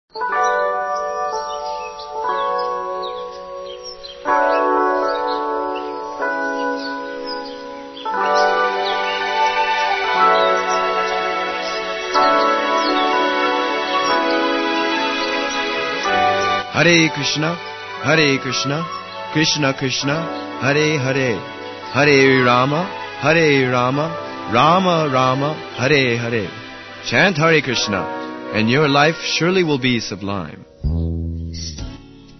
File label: Jingles Chant Hare Krishna; RKC Live Stream